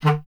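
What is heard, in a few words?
wind bass clarinet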